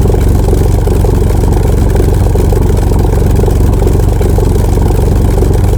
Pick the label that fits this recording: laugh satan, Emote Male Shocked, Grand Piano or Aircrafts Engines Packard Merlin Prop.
Aircrafts Engines Packard Merlin Prop